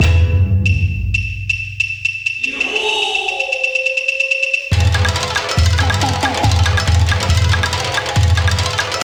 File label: BGM Net Result